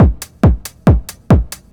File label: drum 138, Techno; Techno ID; PS-45